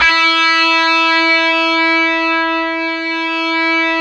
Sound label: GTR, Dirty; Club-50 - Foundations; Paul-Stack